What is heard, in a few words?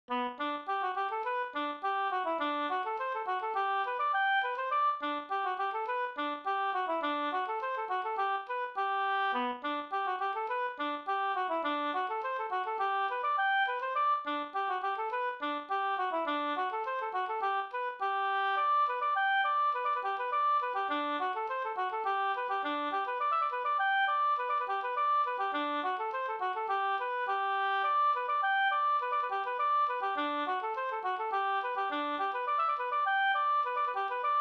polka polkett; e Sahlstrom Up